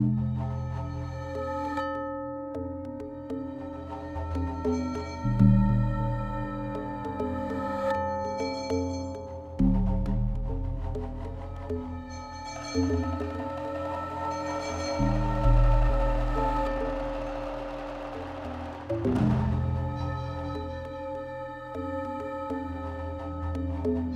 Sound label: Music Gameplay Gameplay State Tension; Sifu